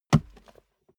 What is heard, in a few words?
step barefoot metal single trample left